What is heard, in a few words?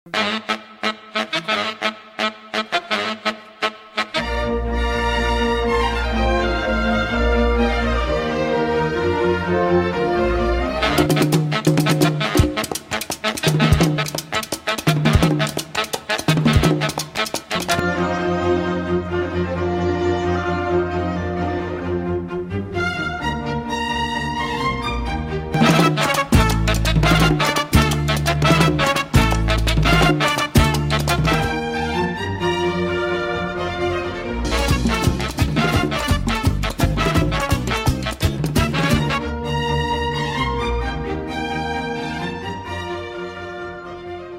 Weird SONG